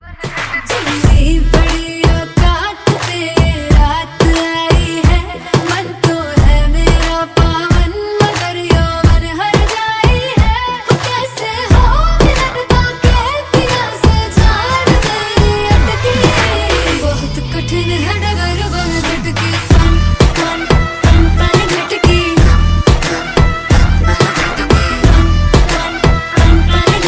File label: hindi ringtone